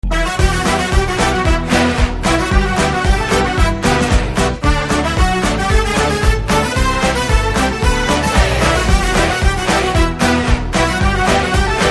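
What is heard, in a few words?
background music Ringtone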